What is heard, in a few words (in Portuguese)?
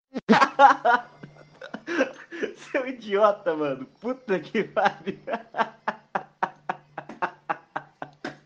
Risada